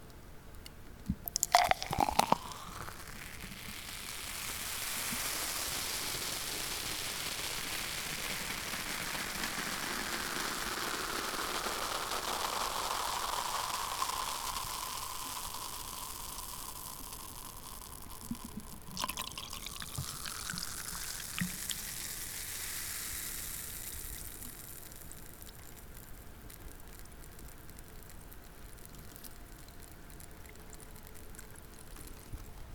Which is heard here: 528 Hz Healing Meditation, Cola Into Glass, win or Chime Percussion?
Cola Into Glass